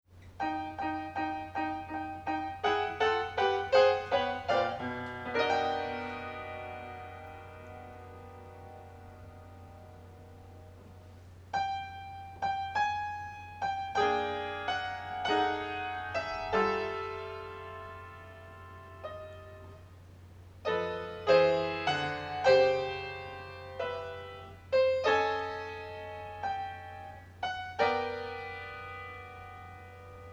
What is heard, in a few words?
Atonally Fantastique